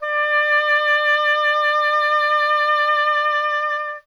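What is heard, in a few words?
wind OBOE; Miroslav Vitous; Solo Instruments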